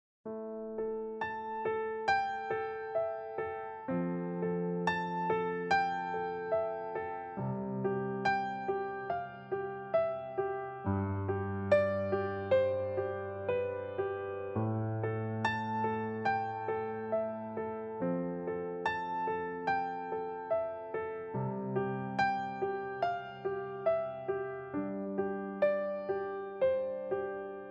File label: Gratitude Piano